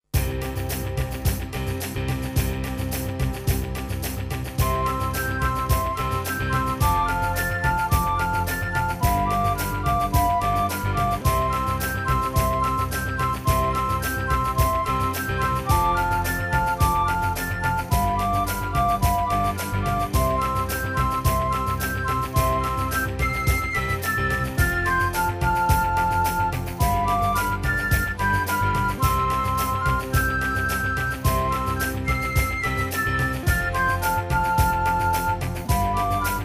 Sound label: dance flute